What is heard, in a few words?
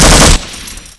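grunt machine gun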